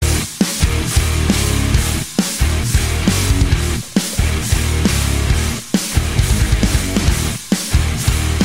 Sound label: Battle Rock